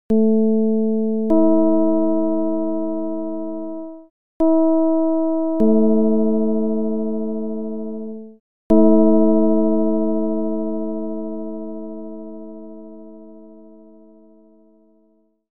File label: csound foscil 220hz